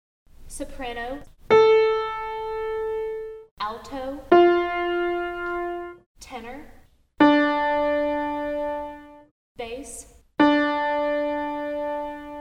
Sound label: Sanctus in d minor, full accompaniment